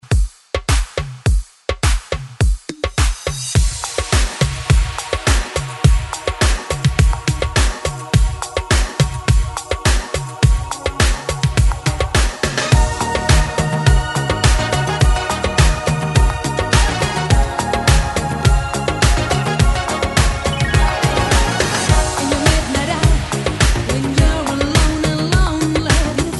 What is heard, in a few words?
80s ReDrum 104 Bpm